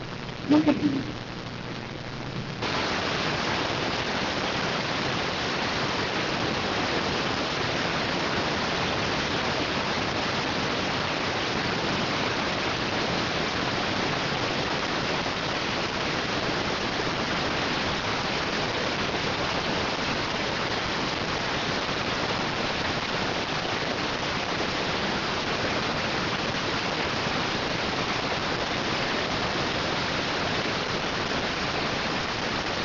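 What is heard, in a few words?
radioteletype receive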